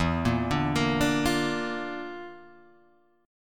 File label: Bb Major E; Bb/E arpeggiated; JGuitar